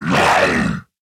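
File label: pain, zombie male; nmr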